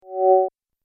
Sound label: Multimedia Rollover, mouseover sound; Andrew Fleming